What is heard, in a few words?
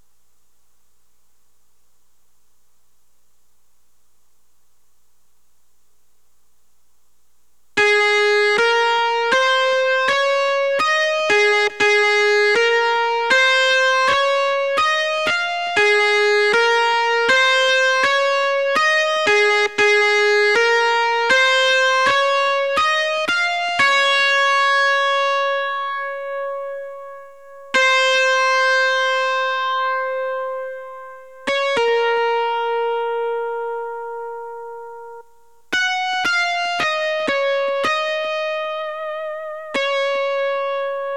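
Guitar Lead Line Recording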